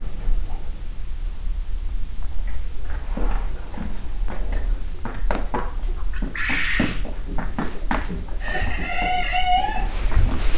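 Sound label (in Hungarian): istállóban póni maszkal prüszkölő fürj; parasztudvar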